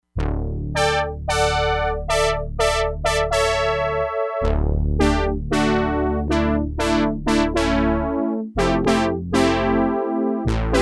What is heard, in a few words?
BRASS, Crumar Bit 99; Factory Programs